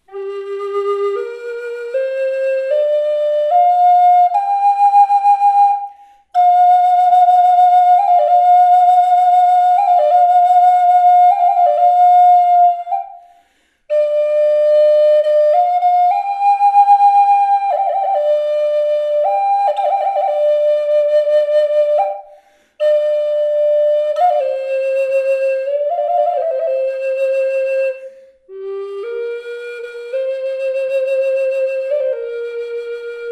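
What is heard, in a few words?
reverb, flute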